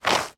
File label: footsteps scrape scuff feet gravel ground